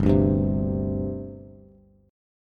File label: G Suspended 4th, Bass; Gsus4 strummed; JGuitar